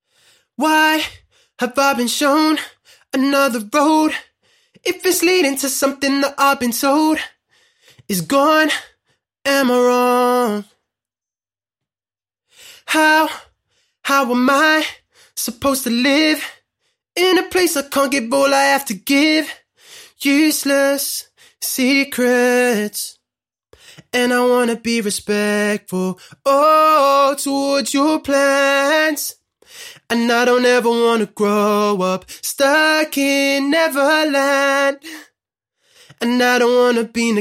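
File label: pop, 78, B major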